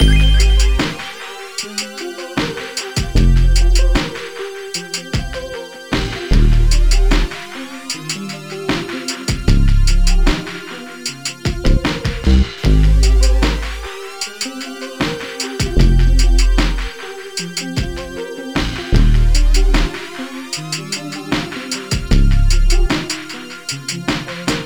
Style Full Loop

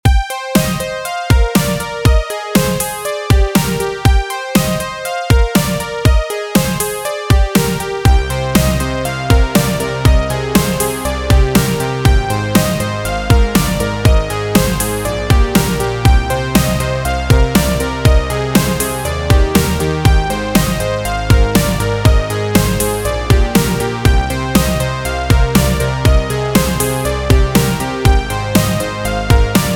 Jupiter Poly Power Pluck

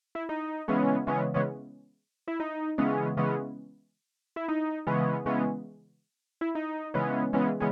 Piano Part

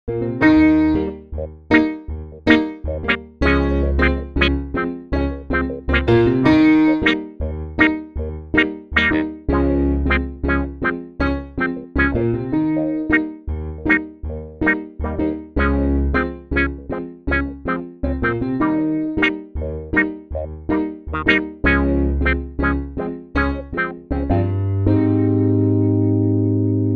piano and clav verse

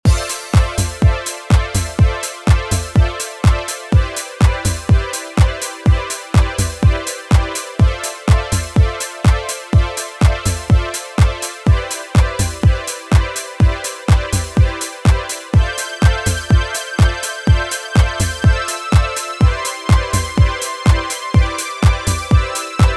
Jupiter Poly Analog Strings

